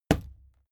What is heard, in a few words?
fight impact sweetener cloth; nahkampf; soundpool